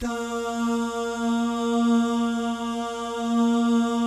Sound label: DAH, jazz FX, mix guy gal; Spectrasonics; Vocal Planet